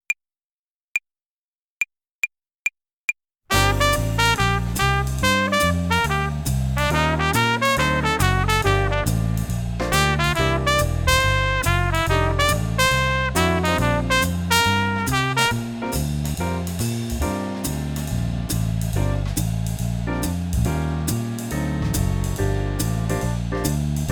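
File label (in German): Swing Phrasierung Übung; play along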